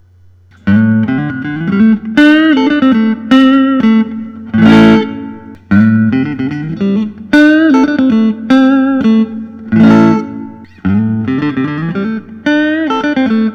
Fender Custom Shop Wildwood 10 61 NOS

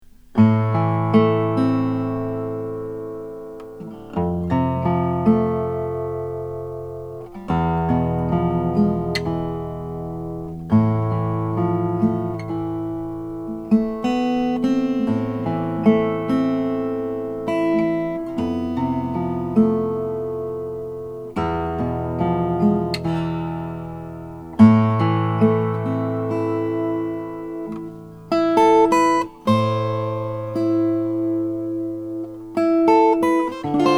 om cutaway brazilian rosewood; 1992; oskar graf

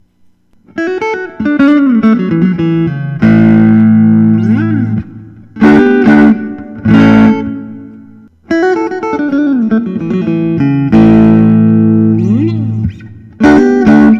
fender custom shop 60 stratocaster

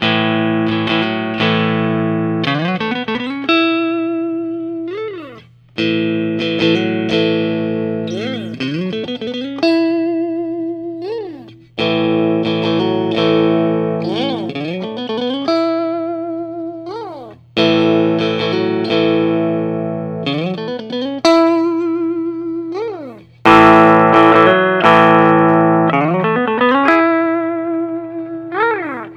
james tyler studio elite hd malibu

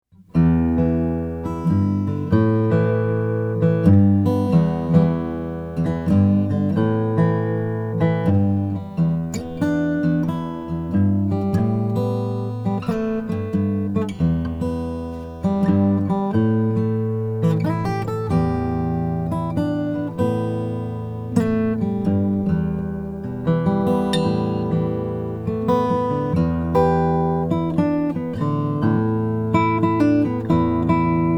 froggy bottom sj sunburst

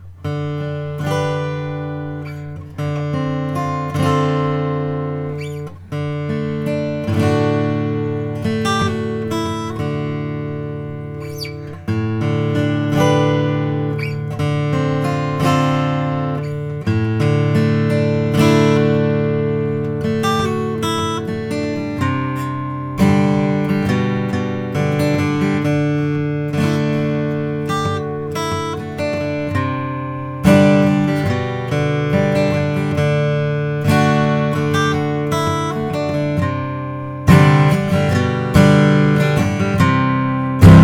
Martin hd 28vs natural with aging

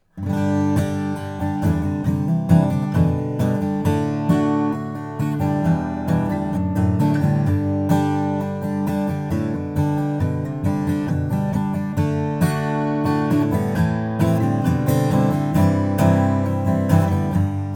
martin concept j prismatic

